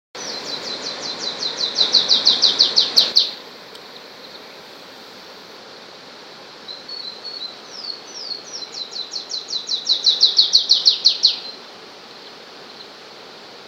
Myiothlypis fulvicauda semicervina, Reinita Culiparda